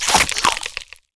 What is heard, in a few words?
TURTLE Attack; Eft; GREEN TURTLE